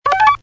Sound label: reveal